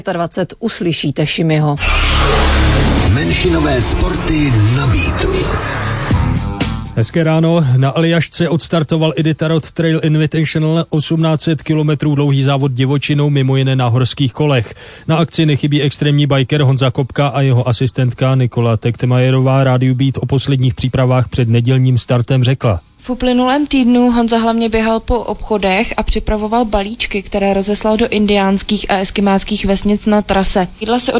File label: Beat Alaska